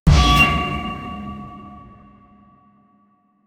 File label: dynamic hit editing sound